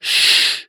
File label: human voice shh male; sound effects one